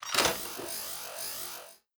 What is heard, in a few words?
lever on off